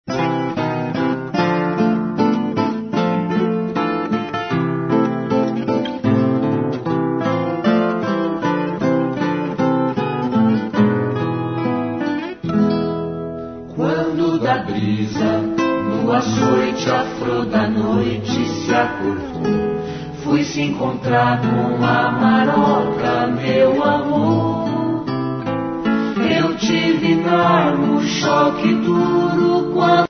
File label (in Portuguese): viola quebrada